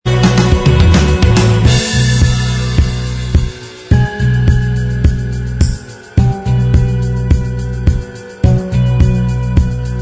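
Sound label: instrumental track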